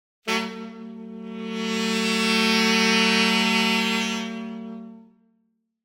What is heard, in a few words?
Horn Section Criminal Increasing - Longer; Hollywood Edge; sound designer's toolkit v2